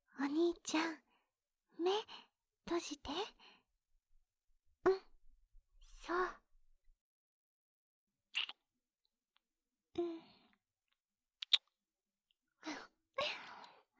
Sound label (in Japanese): 全身舐め; 勇者の妹～おいでっ、おにーちゃん♪～; 効果音なし